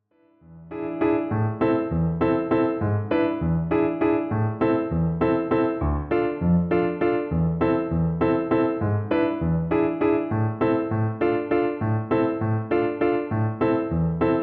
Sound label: five step